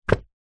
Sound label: household book very small set down or drop; zapsplat